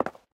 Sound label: concrete step; Golden Crayon Sounds